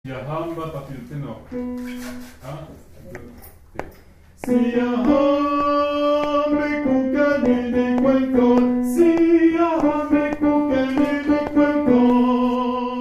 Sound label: Tenors; SIYAHAMBA